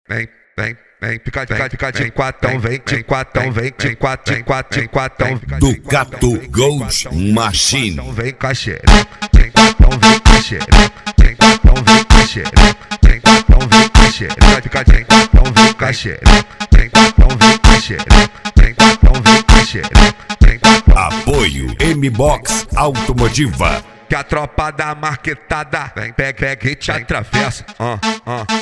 especial funk

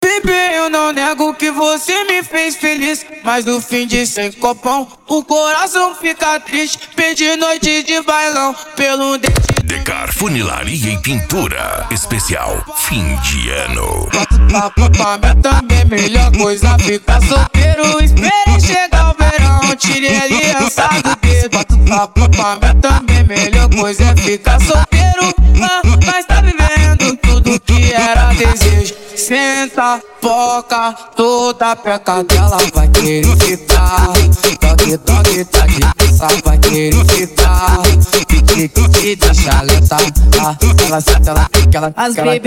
funk ritmado

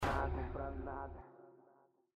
hip hop nacional; terremoto audio car tchau corona

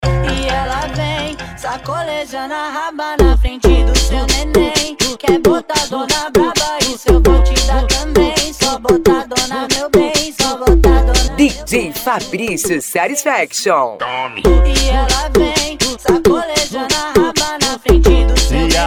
funk e lambadao

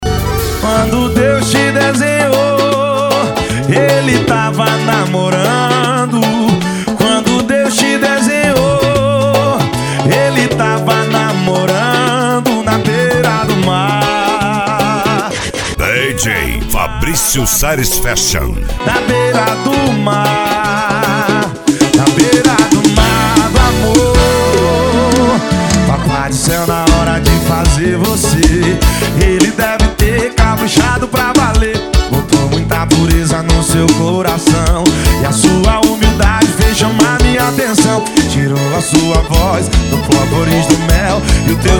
esp sertanejo; brejas distribuidora

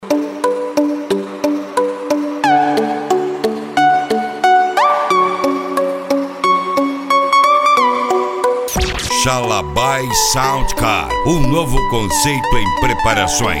sound car mala aberta e mega funk

